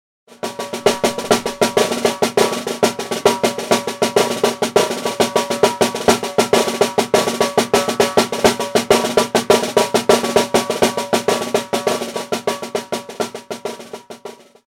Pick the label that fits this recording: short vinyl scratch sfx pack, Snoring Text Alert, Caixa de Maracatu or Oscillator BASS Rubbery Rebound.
Caixa de Maracatu